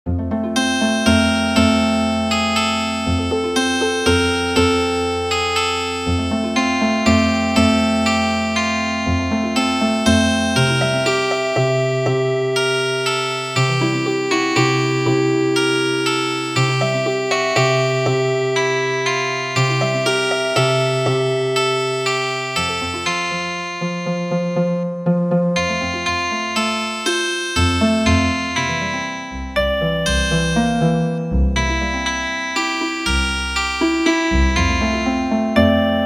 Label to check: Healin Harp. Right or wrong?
Right